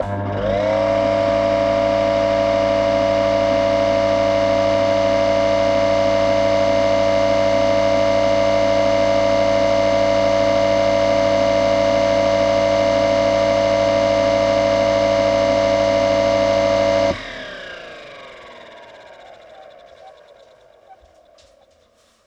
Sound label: Bathroom Extractor, Extractor Fan; Extractor Fans